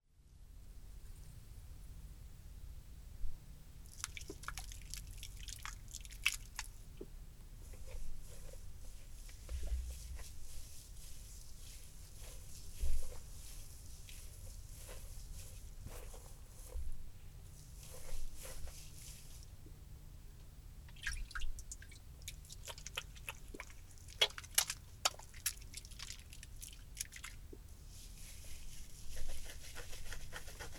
シャンプー; ASMR; さらに3年後

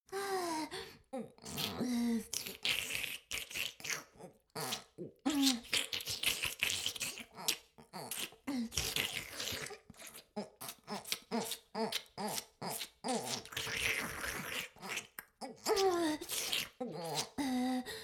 フェラ音声; 魔法少女マスカリマッスル

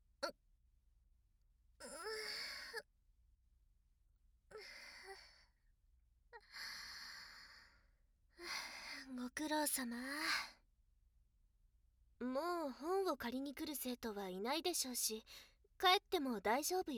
睡姦 フェラ; サトレル図書委員の特権

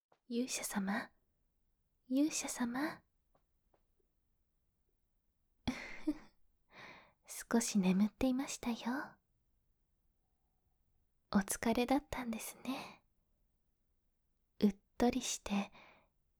耳舐め で 最後の 癒し; おやすみの前に

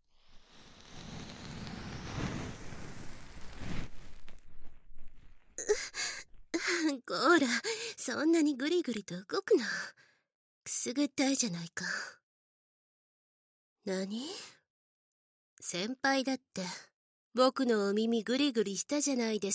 右耳かきパート, ASMR

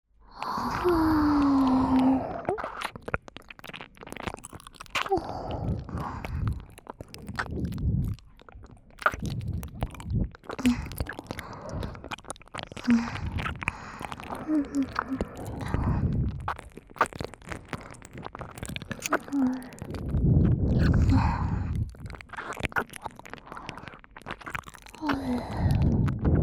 反転 ねっとり両耳舐め, ASMR

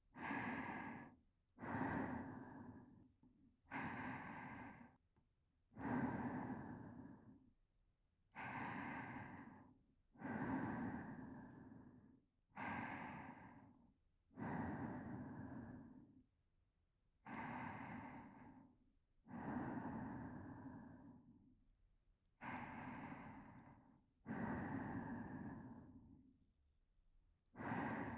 吐息のみ（ハーレム 囁きブースト）; ASMR

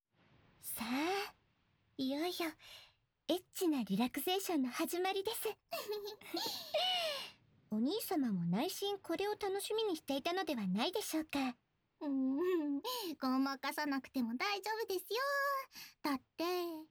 濃厚耳舐め手コキ; 羽純の濃厚耳舐め手コキ; ASMR

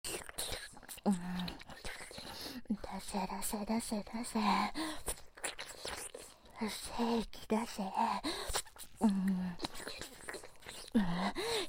右耳ゲップ責め; ASMR

